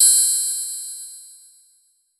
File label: freeze